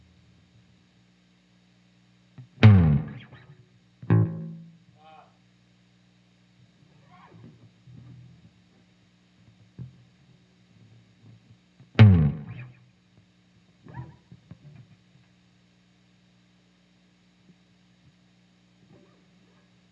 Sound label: bass slide